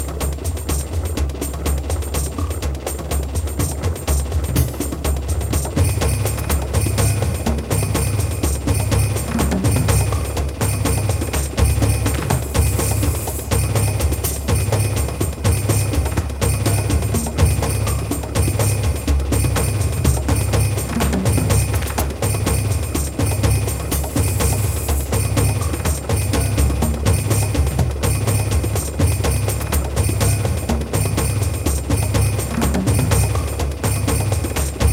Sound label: Battle (Volcano Summit, Low Health); the legend of zelda skyward sword wii gamerip 2011